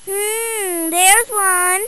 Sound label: hmm